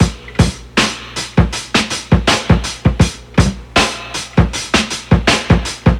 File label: 80 bpm breakbeat sample a sharp key, Free drum loop - kick tuned to the A# note. Loudest frequency: 1519; drum loops